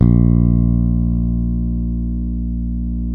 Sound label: bass sustain A#1; Sampleheads; Will Lee Bass Library